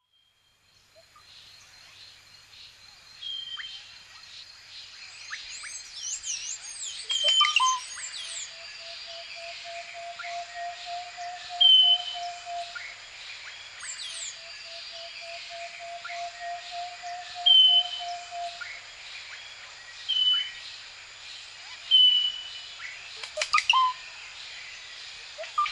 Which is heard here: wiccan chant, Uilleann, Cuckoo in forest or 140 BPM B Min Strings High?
Cuckoo in forest